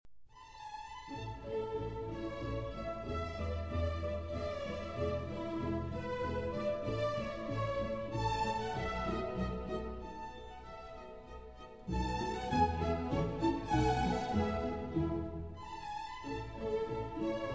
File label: String Quintet in E Major; III. Menuet